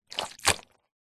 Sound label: household wet cloth drop into ceramic sink splat squelch; zapsplat